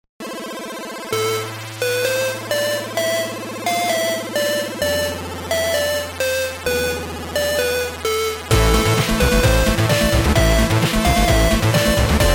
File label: 8bit Metal